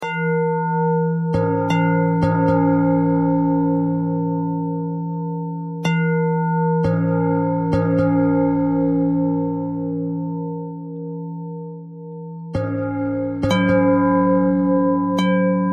bell bell thread noise

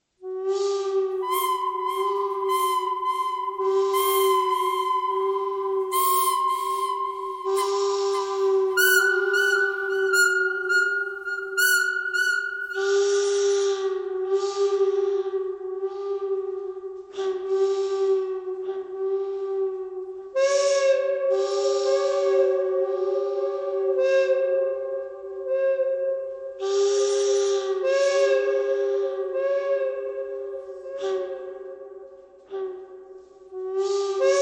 bird canyon thread simple

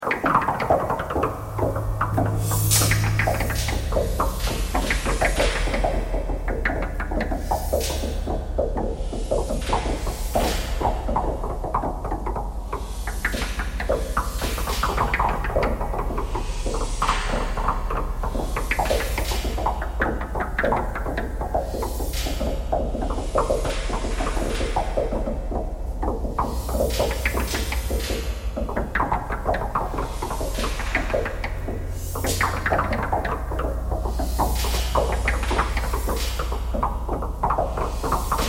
knocking thread noise all

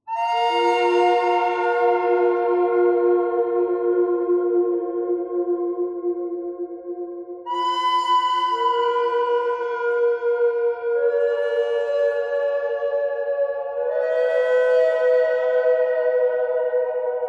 bird thread noise all; birds

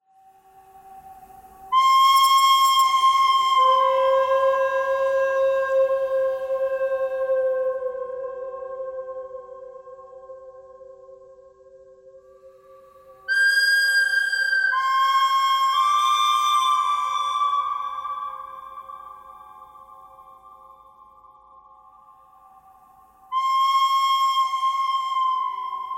bird cry thread buzz

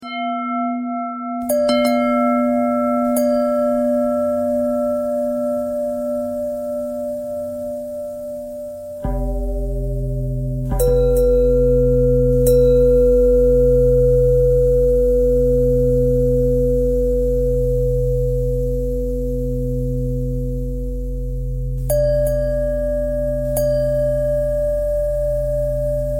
bell bend threads

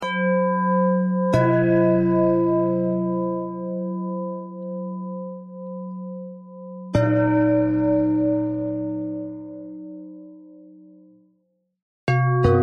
bell bell thread noise

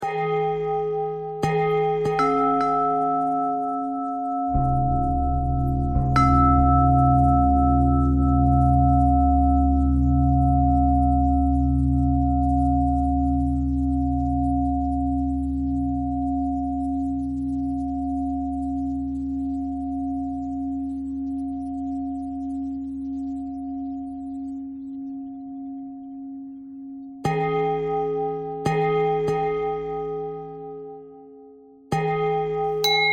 bell bell thread simple, bells

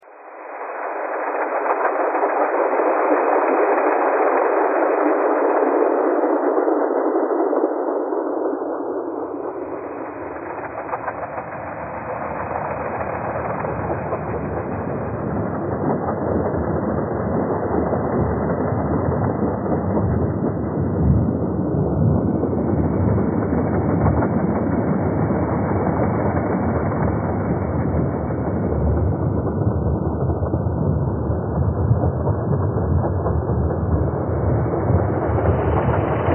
thread pentatonic

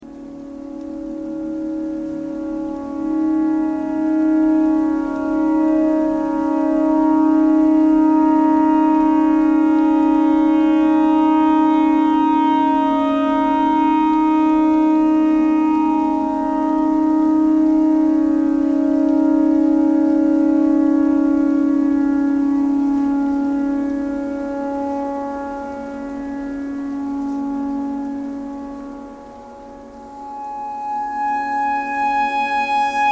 clarinet notes e tornado siren thread pentatonic

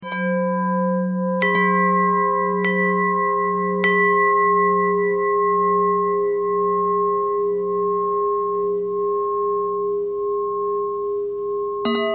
bell bend threads

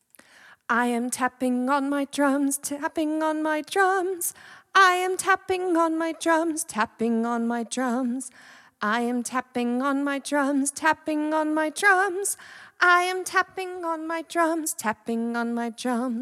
drum hand workout

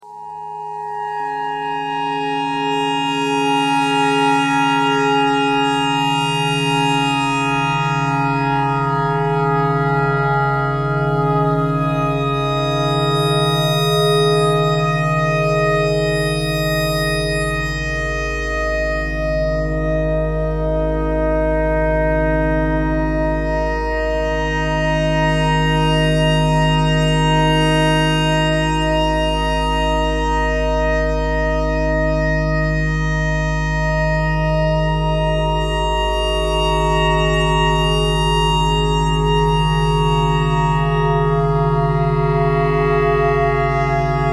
clarinet clarinet notes e thread pentatonic

